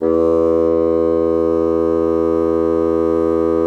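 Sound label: bassoon forte E3; Xsample VOL-6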